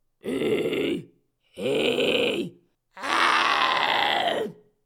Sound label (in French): souffle